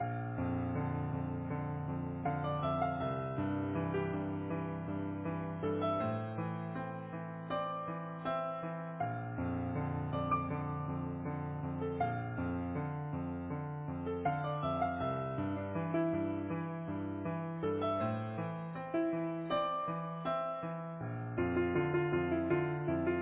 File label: Easy Piano